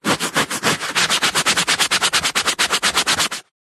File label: household sponge large wipes rubs cleaning fast; zapsplat